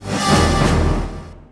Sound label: pvp, sound ui